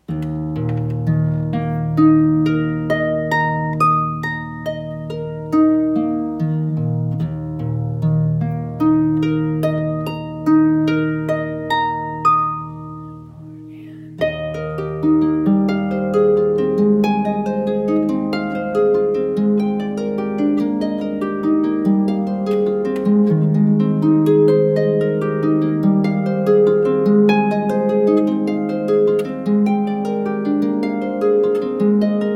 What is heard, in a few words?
Processional Harp